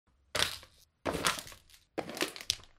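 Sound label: Leather shoes, squeak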